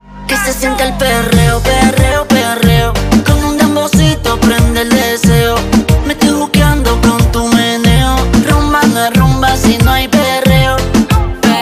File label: Reggaeton